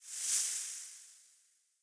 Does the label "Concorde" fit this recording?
No